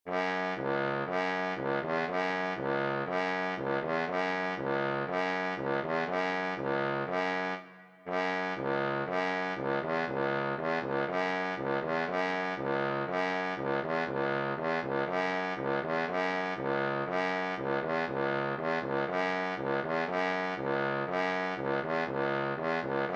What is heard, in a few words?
Trombone